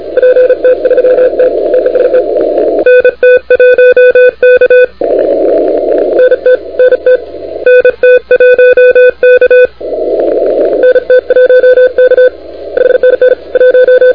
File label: CW 28MHz; ARRL10M